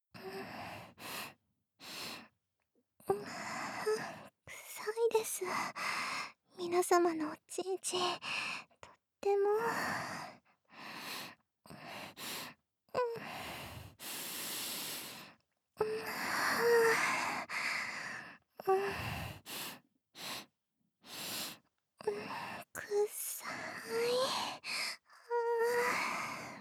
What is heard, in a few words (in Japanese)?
ちん嗅ぎループ